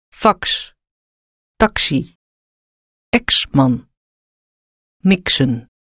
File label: fax